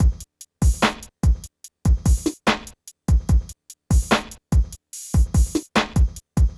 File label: PHATT BEA